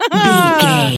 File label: voice female expression emote